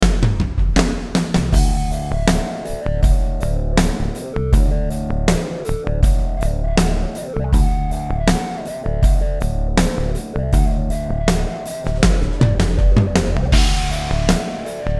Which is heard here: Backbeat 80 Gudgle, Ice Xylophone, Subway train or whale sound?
Backbeat 80 Gudgle